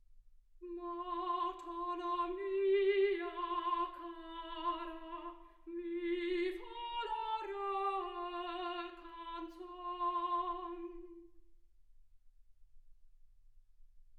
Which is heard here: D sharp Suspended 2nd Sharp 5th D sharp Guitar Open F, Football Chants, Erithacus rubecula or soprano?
soprano